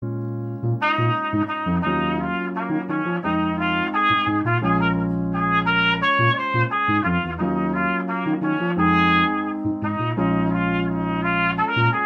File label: jazz